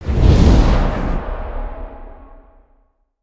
wind leap oneshot

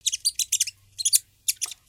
Pet Rabbit Speak; creatures pets

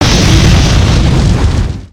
Dragon Flame Roar; creatures organic

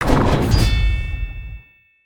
Knight Roundhouse

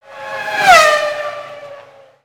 Car Passby Pitch Up

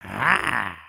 Walk, creatures organic

